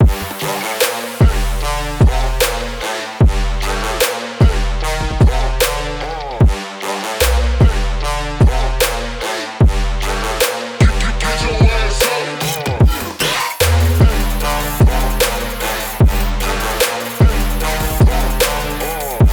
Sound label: Rap; Equalizer